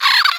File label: Bug